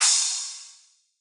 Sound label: Crash, Cymbals; Cymatics; Eternity Sample Pack; Drum One Shots